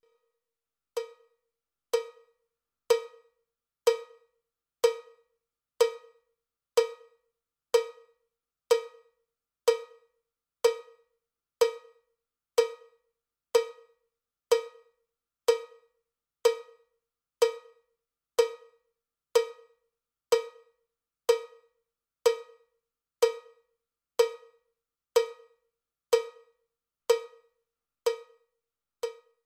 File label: cowbell click track bpm 79; cowbell click track bpm 62; drumtex; cowbell click tracks vol 1